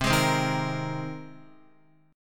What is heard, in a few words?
C Minor 7th Sharp 5th C Guitar